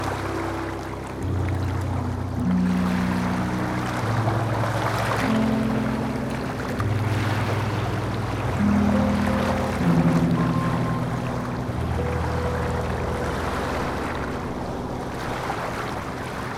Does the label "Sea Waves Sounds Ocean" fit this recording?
Yes